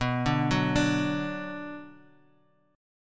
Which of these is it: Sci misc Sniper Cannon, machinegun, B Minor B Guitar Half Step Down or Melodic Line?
B Minor B Guitar Half Step Down